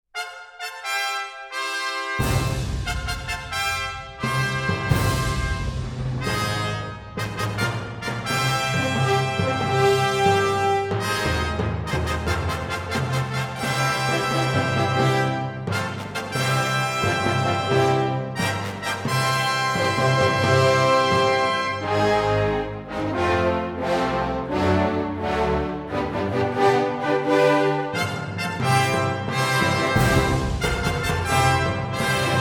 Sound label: Fanfare; Film Version; rise of the guardians